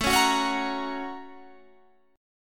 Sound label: Bb Minor Major 7th Sharp 5th